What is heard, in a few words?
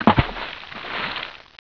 water SPLASH